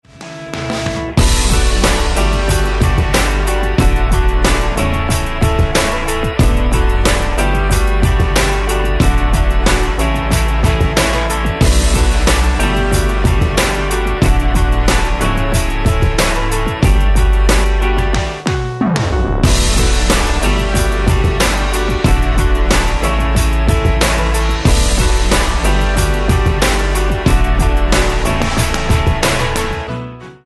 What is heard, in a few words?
karaoke; disney